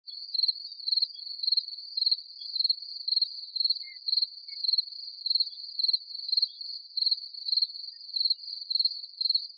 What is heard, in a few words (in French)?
grillon champ